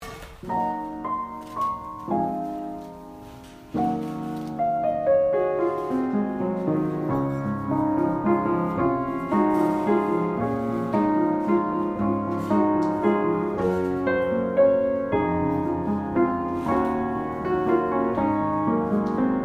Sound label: Piano Accompaniment; choir practice